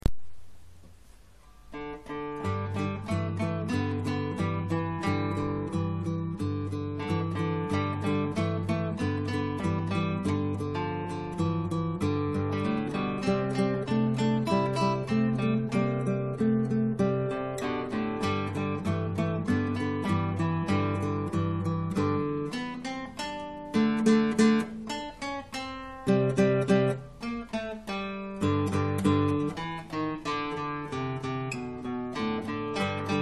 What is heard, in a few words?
Rock duet, gitaar